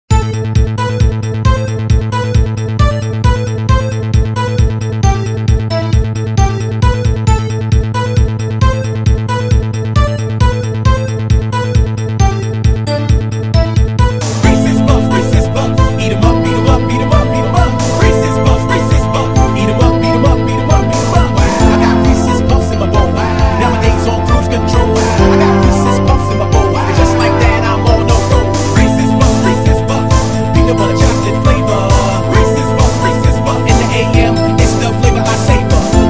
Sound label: questioning (allegro)